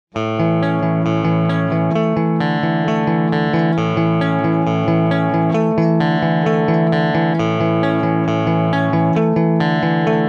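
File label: CLEAN, Guitar Rig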